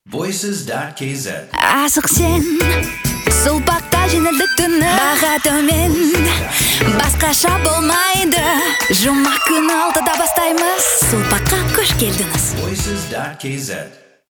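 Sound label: vocal Kazakh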